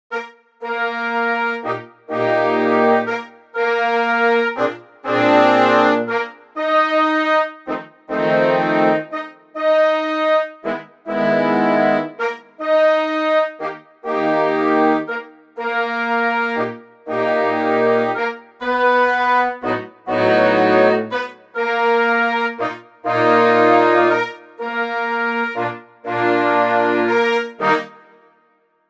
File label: sympho brass